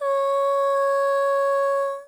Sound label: Choir; osu